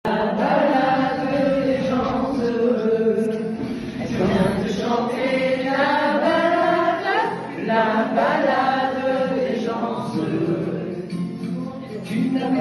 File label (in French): karaoke